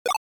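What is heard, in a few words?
Game Boy General Pitch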